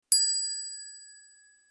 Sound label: notify ping